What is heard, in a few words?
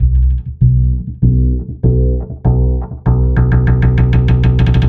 Remixed Acoustic Bass